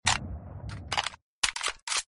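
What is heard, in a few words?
AWP reload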